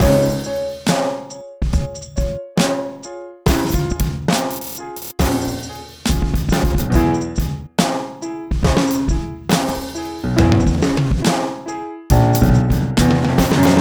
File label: piano wild drums